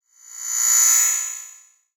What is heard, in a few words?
sci fi scanner; PremiumBeat SFX